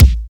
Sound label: shiny kick drum one shot c key